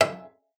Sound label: FLAG E5; Xsample VOL-8; LAUTE+FLG